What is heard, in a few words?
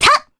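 Vox Jump, Japanese; voices; heroes; Mediana; kingsraid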